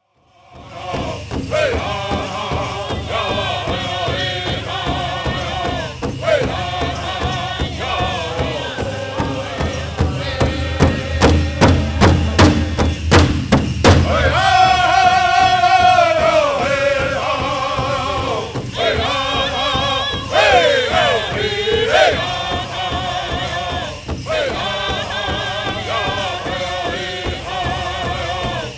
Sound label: Yellow Spotted Horse Song